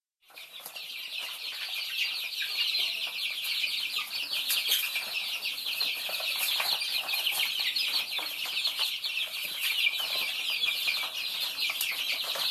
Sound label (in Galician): son galpitinhos; animais